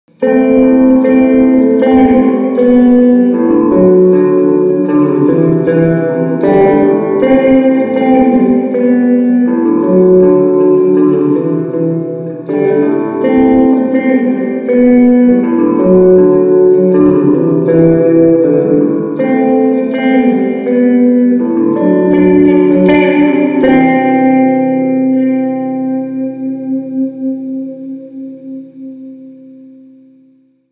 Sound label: Both Springs Full Blend